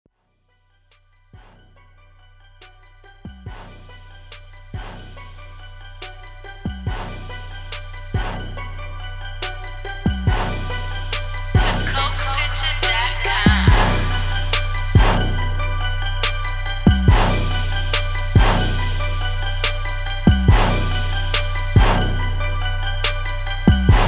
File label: Instrumental